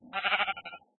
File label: sheep; Minecraft; 4jcraft; mob